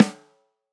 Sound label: Snare, drums; Ableton Live 12 Lite Core Library